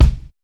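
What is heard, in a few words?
bass drum, Drums Acoustic; AKAI; 90s sample CD; USB Soundscan vol.10; RAW KIT 9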